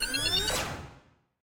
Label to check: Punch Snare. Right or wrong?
Wrong